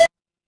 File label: retro game text blip